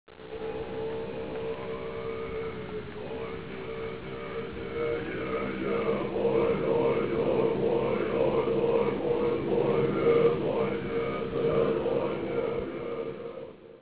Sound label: gelug chant